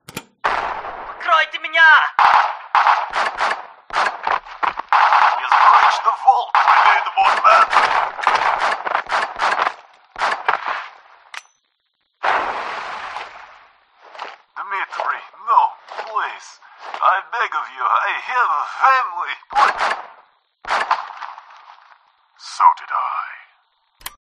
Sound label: log